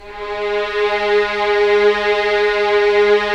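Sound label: Strings Violins; Roland - LA Composer vol. 4; 90 s Sample CDs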